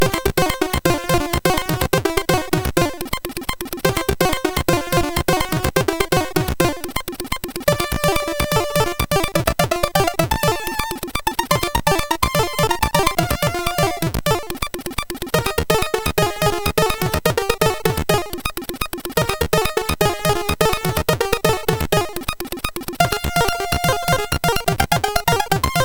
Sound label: Samba, MOS6581R3; L Samba; hvsc